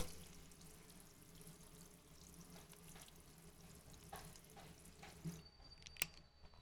Water Shower And Close Button

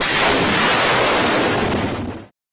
Hellfire Rocket Missile Launch

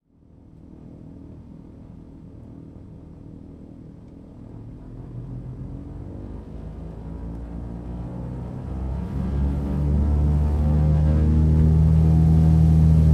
Dc3 Takeoff Inside Short

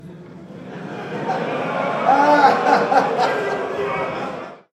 Massive Men And Old Man Laughter

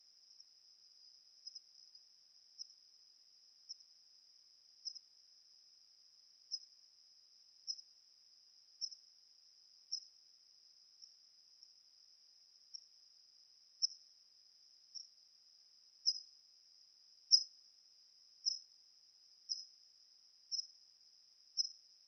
Bat Processed

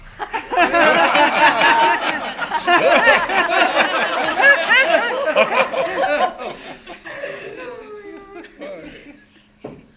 Laughing Audience Laughtrack

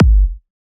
Deep House Kick

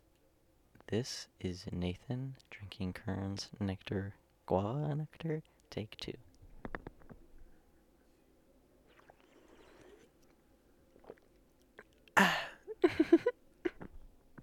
Drinking Guava Nectar

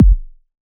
Kick

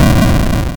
Explosion

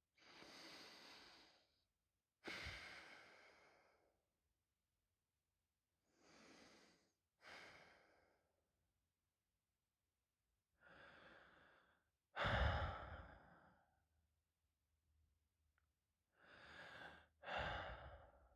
Person Sighing Mouth And Nose

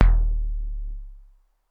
Modular Hits

